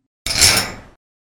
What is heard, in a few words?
Drainage Grate